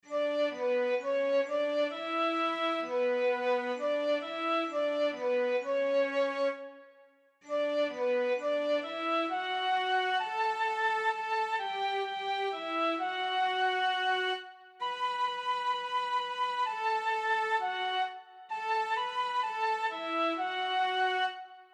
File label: Karaoke; Saame 23